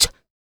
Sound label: FEM PERC, Vocal Jazz FX